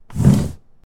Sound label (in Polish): przestawianie kosza; apocalipsis